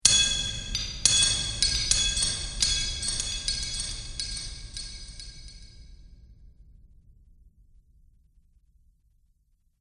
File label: Metal Chains Magic Ambience; Clink, Clatter, Clang, And Clink Are The Sounds That These Chains Make.; Andrew Fleming